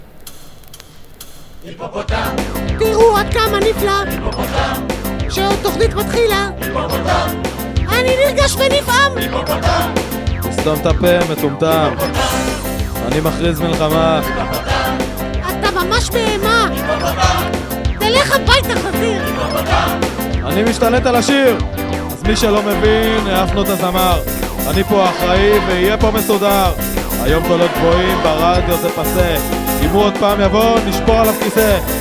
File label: opening song; hipo